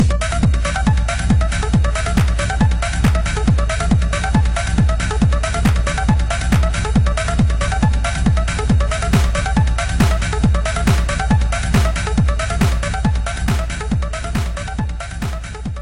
tekkno klatsche

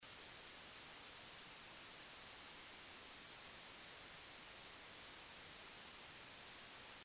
weisses Rauschen

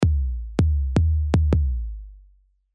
808 nach kompressor und high pass bei 50 Hz

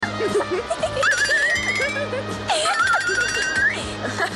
klingeling

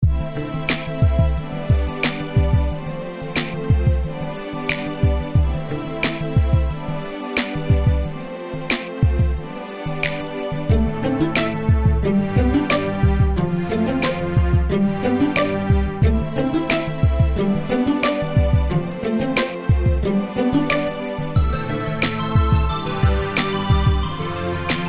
akkorde

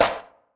snare schnipsel snare

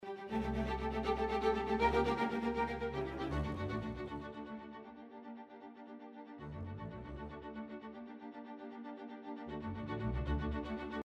Streicher Quantisierung

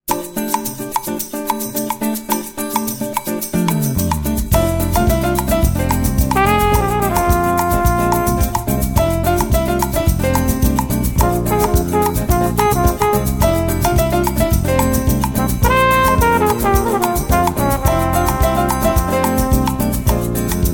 fluegelhorns

